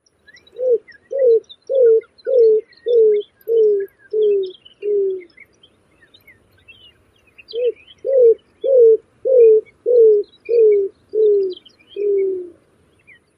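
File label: song, greater roadrunner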